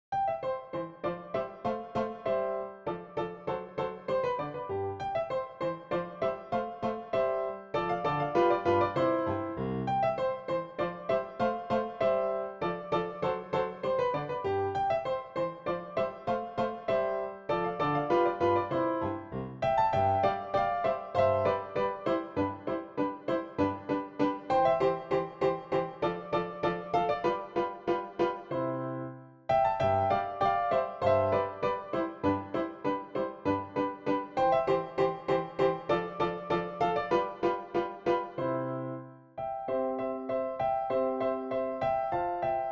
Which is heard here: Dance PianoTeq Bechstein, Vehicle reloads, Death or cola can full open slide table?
Dance PianoTeq Bechstein